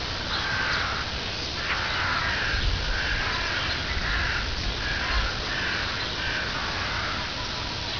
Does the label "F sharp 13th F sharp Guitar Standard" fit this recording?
No